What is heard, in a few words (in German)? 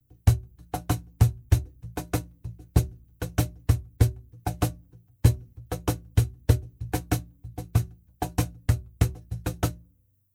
Cajon Groove, AKG 414 Raum geschlossener Pitch Control